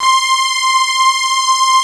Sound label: FUZZY LEAD; Pro-Rec - Synthsonic; TRINITY